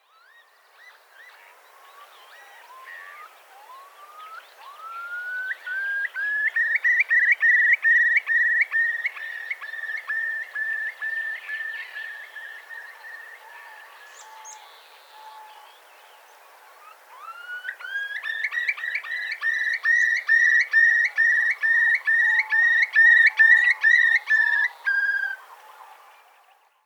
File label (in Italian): chiurlo maggiore